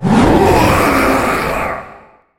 Soul Control effect

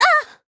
pain; Witchblade

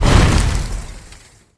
Dragon skill dmg; BuzziDragon

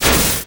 Electric Bomb; Helroid

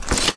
hold armor

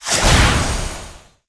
Lizard Skill, mon

